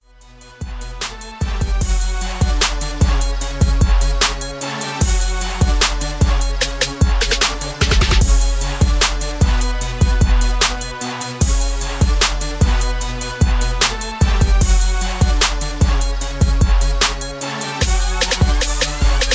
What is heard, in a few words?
75BPM